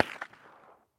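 tihij dalnij vistrel iz vintovki s glushitelem, quiet distant shot from a rifle with a silencer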